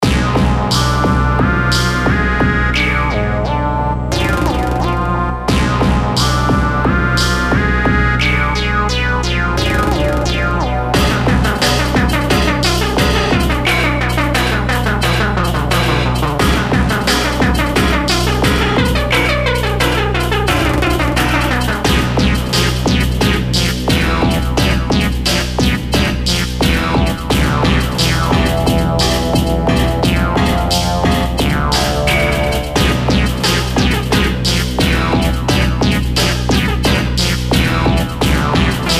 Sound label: BGM VOLCANO; The Denpa Men 2: Beyond the Waves